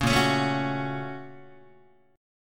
Bb Minor Major 7th Sharp 5th, Guitar; BbmM7#5; strummed; JGuitar

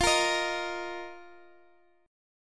F 7th Suspended 4th F down Guitar Open D

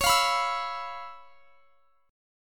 C sharp 7th Sharp 9th, chord; C#7#9; strummed

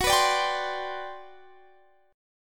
G Major 11th Gb

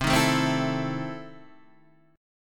E Major 7th Suspended 4th Sharp 5th, C

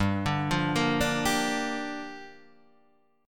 G Minor 7th, Guitar, Half Step Down

